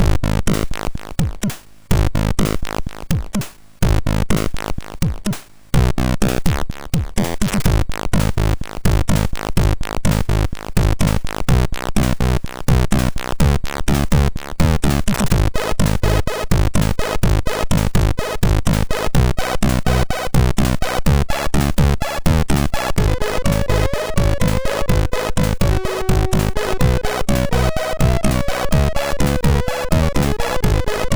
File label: Just Kick It, MOS6581R2